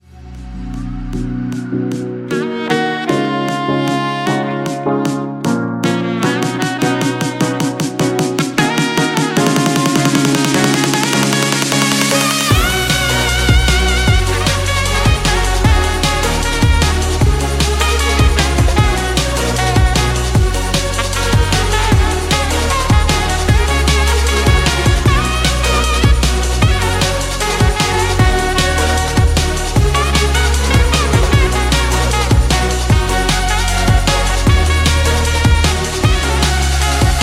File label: evening sax